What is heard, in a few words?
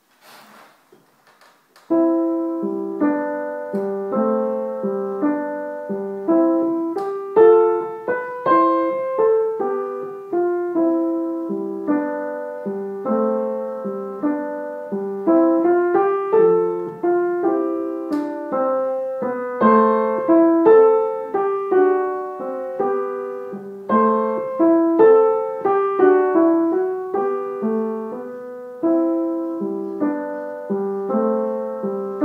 little hush song; dunhill thomas